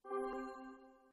race lights go; lightning speed

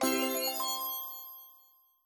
win; Blackjack lucky

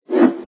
swipe; inlogic; arcticpong

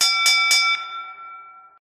train bell; crossthatroad

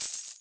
coin; Ninja-Action-2

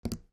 button click; Casualino; Blackjack lucky

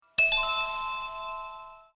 result lose; inlogic-checkerslegend

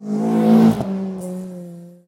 Driveby; Rally Champ

